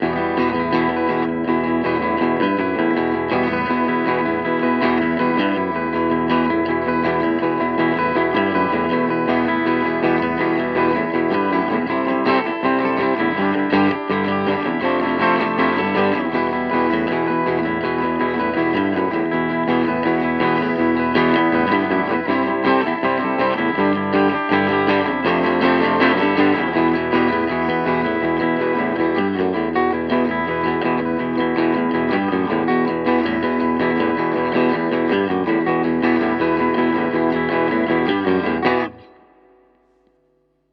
Maybach Harley Benton Vergleich Maybach Clean

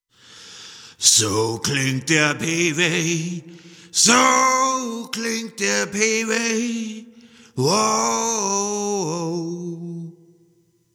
Effektkette

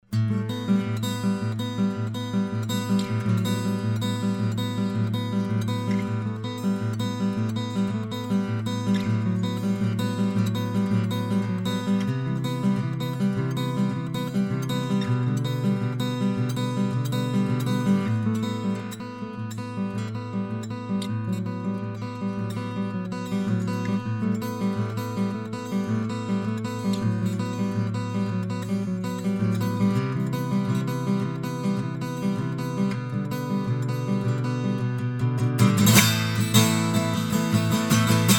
Clarett on Air Akustik Gitarre

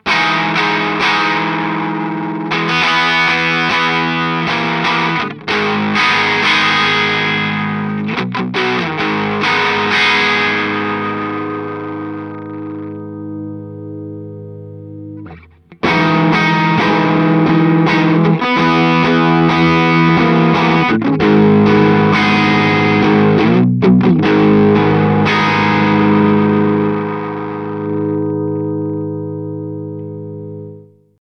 Earthquaker Devices Zoar Treble auf u zugedreht

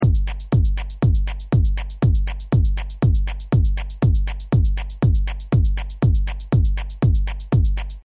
TD-3 Drumpattern

